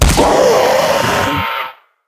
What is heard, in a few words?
fs Boss Hit Heavy; wild worlds